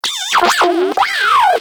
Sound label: Moa Compassionate Vocal Death; Pets; Warframe